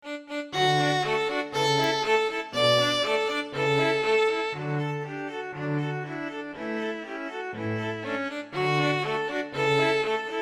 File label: ecossaise, string quartet; beethoven